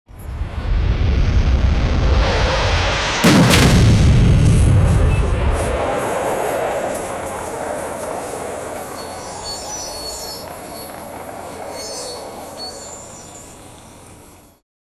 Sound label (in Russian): napryazhennyy fon dlya